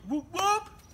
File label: humman whoop